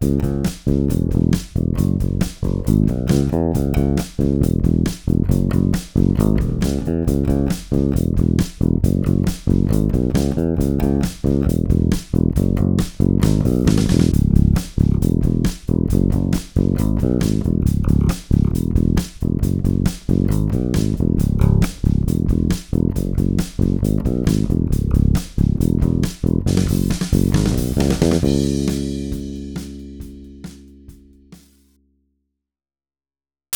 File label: rock